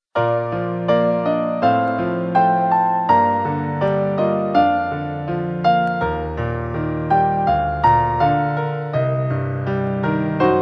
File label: musica